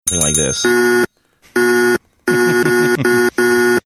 slow the s down warning sound

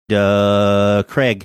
duuuuuuuuuh

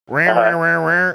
imitating sewing machine sound

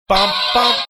singing bomp bomp

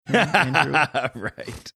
laughing saying right

